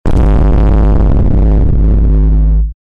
Extreme bass boosted

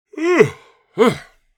Old man exaggerated yawn

